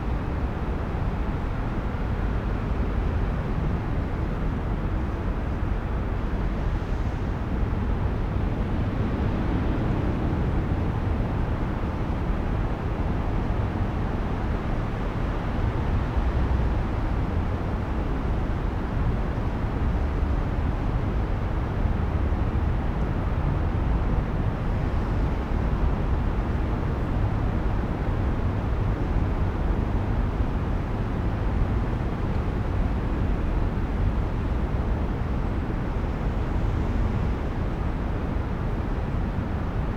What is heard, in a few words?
Urban din day traffic peak; urban and suburban; boom library